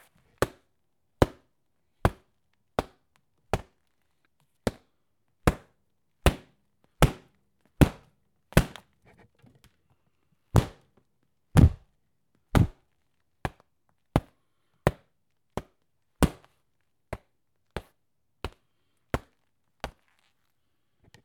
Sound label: Boxing Mitt Punches Strike Thud Whack